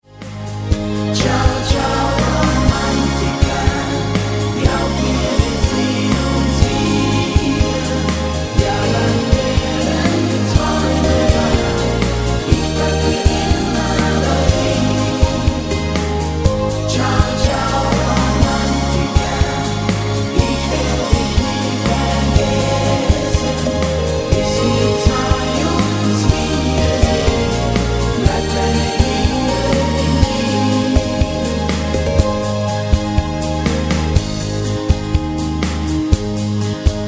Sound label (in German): mit Chor, Playbacks; Klang-Fabrik